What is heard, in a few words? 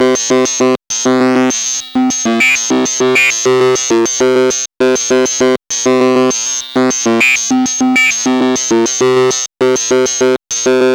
MS 20, DRONES & PADS; 90's Sample CDs